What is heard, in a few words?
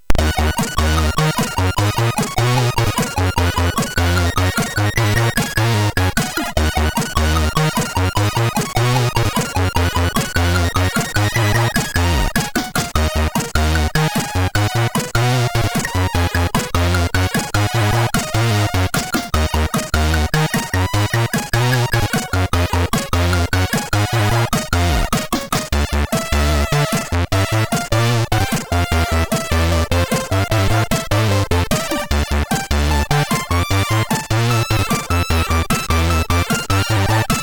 Revenge, MOS6581R3; hvsc